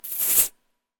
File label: food drink open beer bottle cap hiss; zapsplat